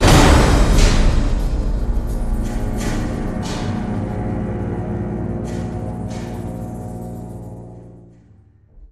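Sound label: sting, action over; transformers war for cybertron gamerip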